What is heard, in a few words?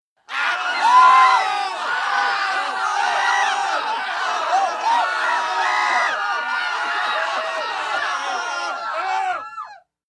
Crowd Panic Screams